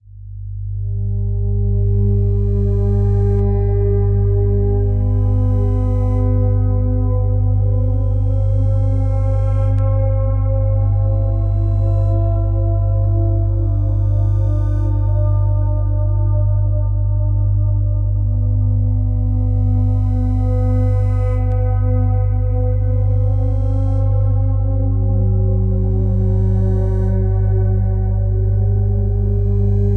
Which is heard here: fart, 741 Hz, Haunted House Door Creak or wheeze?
741 Hz